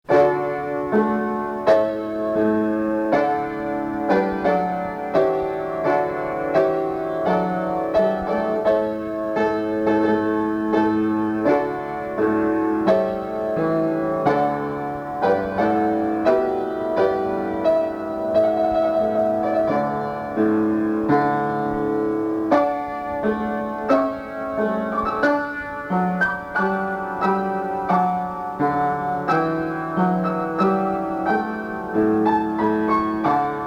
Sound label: Trumpet Voluntary; Purcell